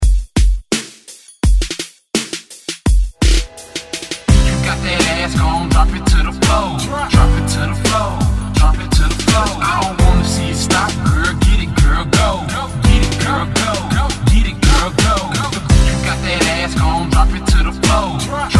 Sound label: Hype 90s Rock Re Drum 84 Bpm; DJDOLLS